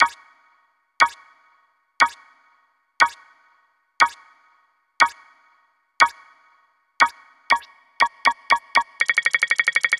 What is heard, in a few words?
10 sec countdown